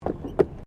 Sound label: Tram Horse Drawn Step Wooden Bridge